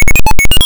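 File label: high score sound; Keen1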